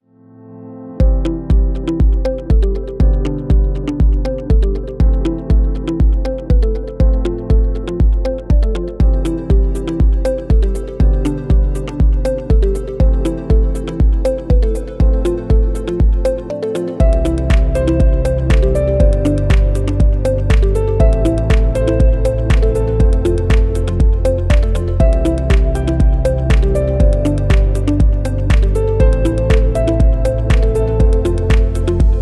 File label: Positive Corporate